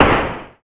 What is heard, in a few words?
Impact; infiltration